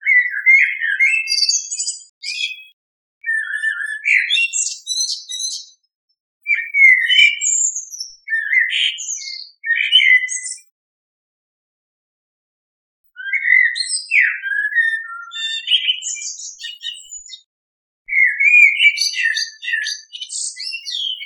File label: Turdus merula male song at dawn